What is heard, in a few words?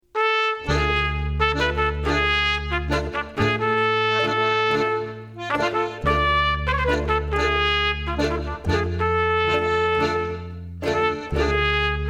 Oriental Hora